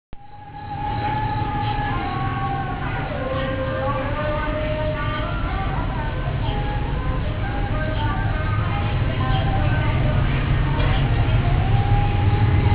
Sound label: birds voice; thai cambodia